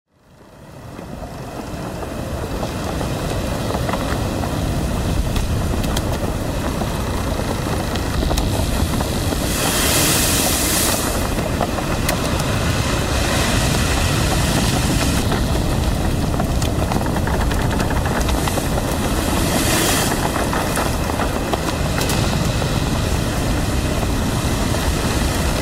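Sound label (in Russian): скрип парусов и дерева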